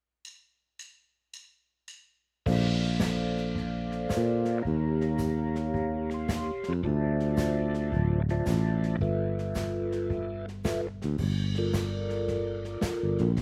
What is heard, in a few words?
8 bar phrase rhythm only